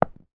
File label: industrial small nail drop on carpeted floor